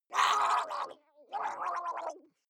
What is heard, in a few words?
water monster female gargle deep pain; koerpergeraeusche; comedy-cartoon; soundpool